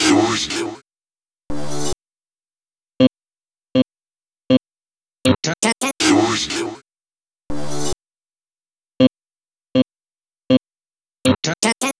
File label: ELECTRO SET; Bass; Sonic Foundry (Sony Creative Software); Not Just Another Pretty Bass